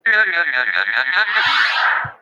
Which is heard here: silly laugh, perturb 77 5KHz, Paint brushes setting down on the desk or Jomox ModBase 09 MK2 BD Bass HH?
silly laugh